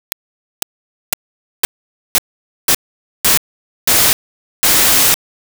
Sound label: noise bursts